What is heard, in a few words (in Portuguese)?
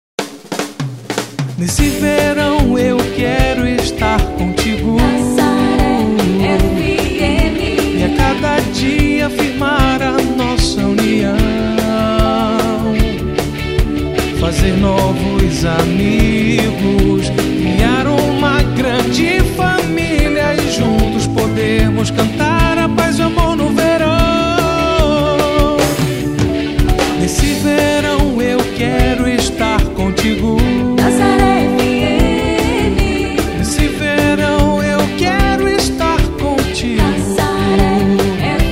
jingle de verão, rádio; rádio nazaré fm 91.3